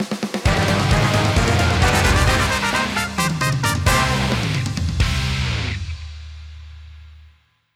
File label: Event Battle (Victory); paper mario the thousand year door complete; Lord Crump